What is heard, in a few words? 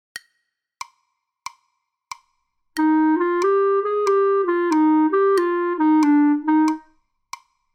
Jazz Clarinet; Beginning Jazz Clarinet Studies Recordings V2